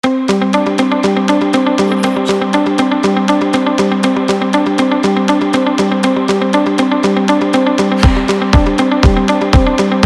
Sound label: indie summer; musicarea